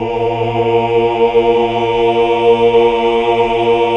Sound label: HAL OOH OOOH Oct; Sound & Vision - Gigapack II CD 1 (Roland)